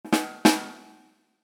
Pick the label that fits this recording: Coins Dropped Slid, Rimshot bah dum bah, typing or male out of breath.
Rimshot bah dum bah